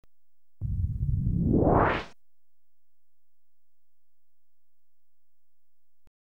Sliding Door (Slow, No Hum), Electronically Generated Sounds; BBC Sound Effects Library